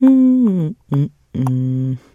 trader hum; 商人杰恩（七日杀）

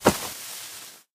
firecracker footstep; 烟花炮手; 烟花炮手（Firecracker）可以发射一个带有爆炸的烟花，能够破坏目标和目标后方的敌人。

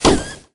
skeleballoon pop

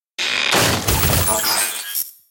electro cuter; Emote; Tesla Tower

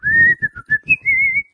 Emote Whistling; Princess

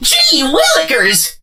hurt

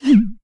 dryfire

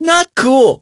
hurt; Poco

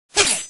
gob attack

